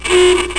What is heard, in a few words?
aoogah horn; Becher Tycoon